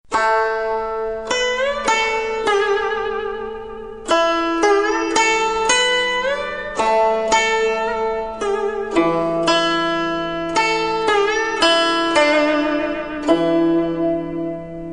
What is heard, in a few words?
zheng solo